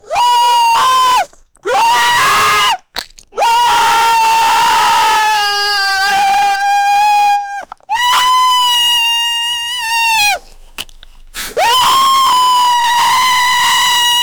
clown lauging and jumping

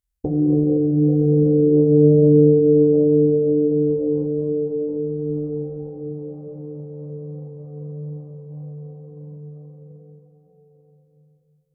eastern long tribal war d, tribal

